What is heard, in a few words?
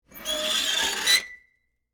Iron Board Unfold, Household, Metal, Squeak; Laundry Sound Effects; BlastWave